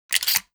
shotgun insert; gmod; arccw uc commons attachments

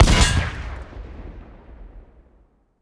armor pen; gmod; lvs framework

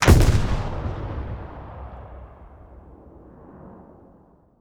explosions; gmod; lvs framework

